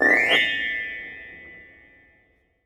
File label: String Brush, piano; Piano Miscellaneous